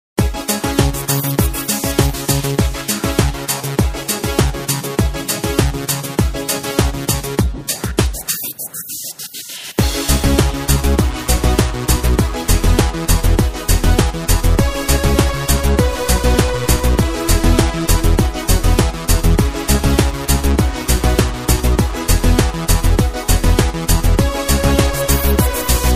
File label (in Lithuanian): Funky be taves